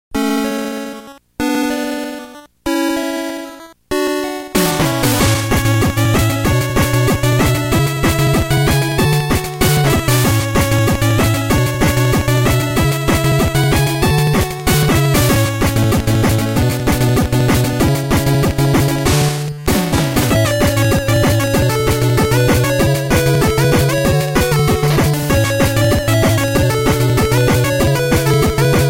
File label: 8-bits